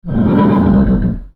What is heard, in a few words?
horse